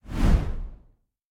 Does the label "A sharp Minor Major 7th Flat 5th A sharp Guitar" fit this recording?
No